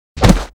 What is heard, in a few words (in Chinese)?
倒地; Player